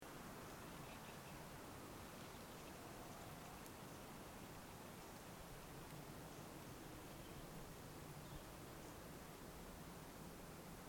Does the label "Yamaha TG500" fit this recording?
No